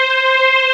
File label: PAD, Drum & Bass 160 BPM; Ueberschall; Drum & Bass Flash Trackz